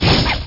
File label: RING FIRE